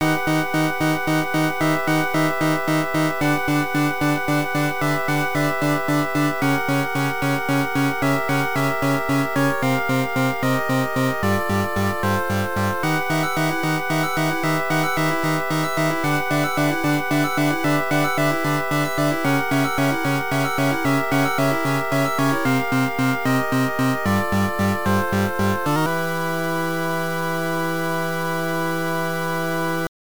Death; Jaseiken Necromancer; turbografx 16

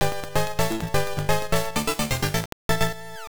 Team Switch BGM; Clash Pennant Race 2; MSX2; SCC

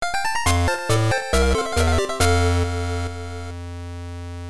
Game Over Jingle; pop'n music GB Animation Melody

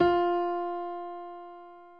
F Piano

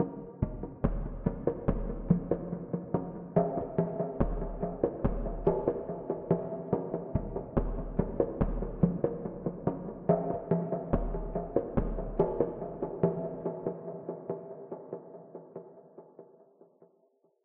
Djemba

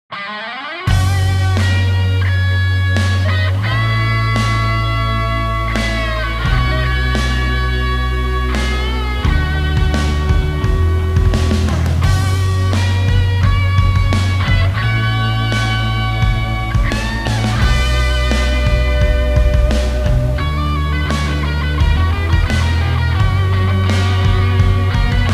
music credits princess

